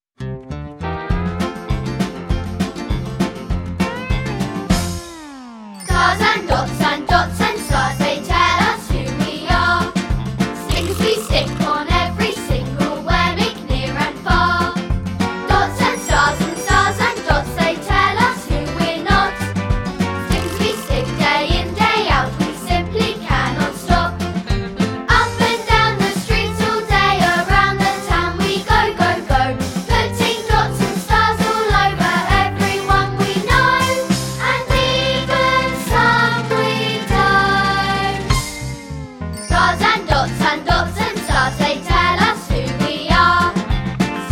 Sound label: Stars And Dots Vocal